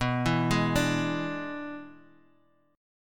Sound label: E 7th B, guitar